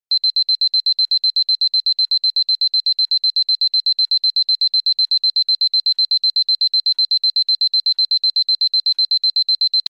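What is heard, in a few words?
fast beeping of an alarm clock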